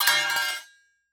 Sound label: Impact Spade Dropped On Concrete